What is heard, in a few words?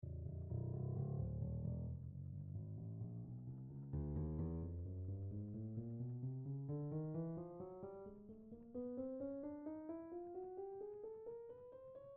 scale, Salamander Grand Piano; NoctSalamanderGrandPiano